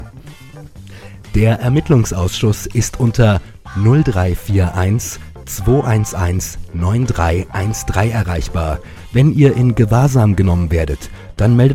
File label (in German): Jingle kurz